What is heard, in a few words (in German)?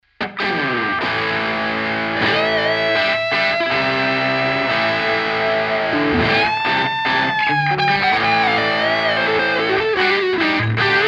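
Kanal B Les Paul Steg PU rhythm solo Kanal AB hot wall of s; two notes le crunch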